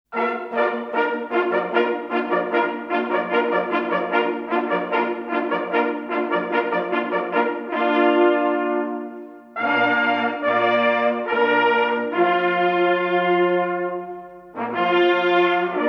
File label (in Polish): lis na rozkladzie, sygnaly